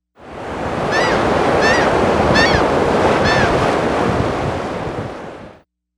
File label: Seagulls; Chimera